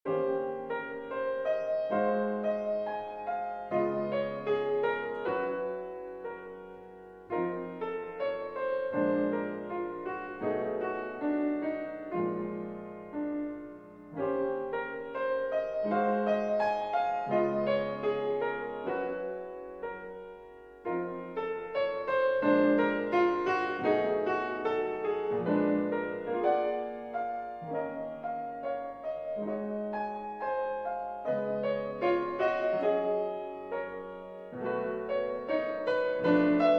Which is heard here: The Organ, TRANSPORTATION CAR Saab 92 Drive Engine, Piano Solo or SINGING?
Piano Solo